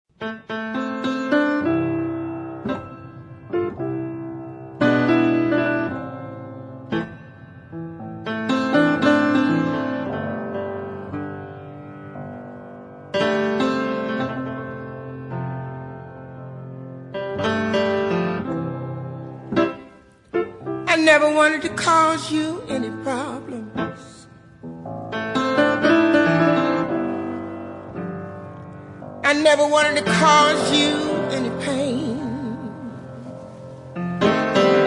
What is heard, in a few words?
(Blues Cover)